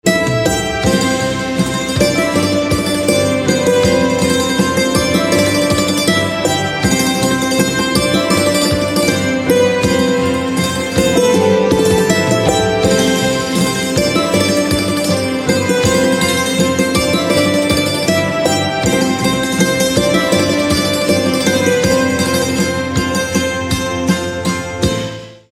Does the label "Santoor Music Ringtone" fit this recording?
Yes